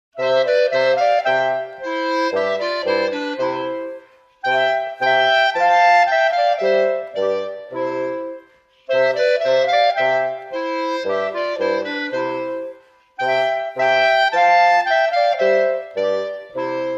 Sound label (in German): Tafelstueck